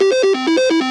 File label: spin loop, casino slots